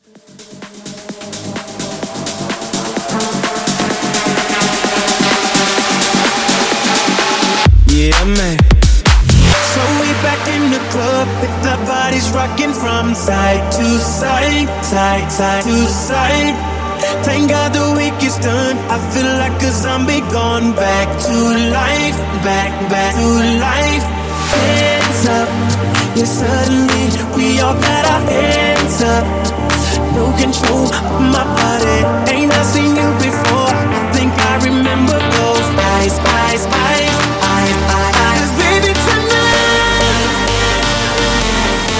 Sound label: House Hybrid Reboot